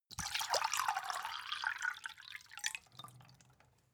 glass pour bottle; berufe; gastronomie; soundpool

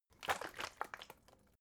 bottle water big shake single soft; gastronomie; berufe

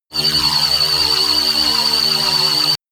shot annoying buzzing tooth low; zaps buzzes

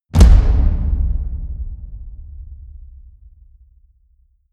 drum hit hollow medium; percussion

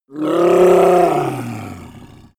yeti growl struggle

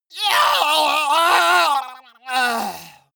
male die gurgling; soundpool

